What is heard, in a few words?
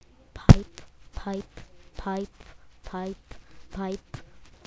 pipe